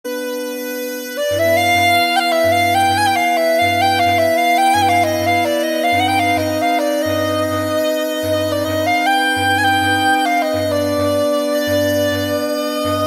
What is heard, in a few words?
galop; Michel Aumont